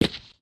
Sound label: concrete, human step; test concrete